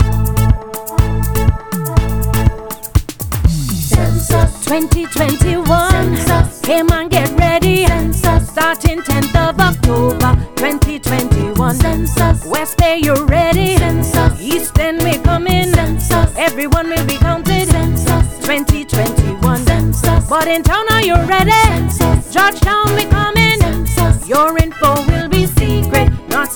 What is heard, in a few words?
Jingle; Full Census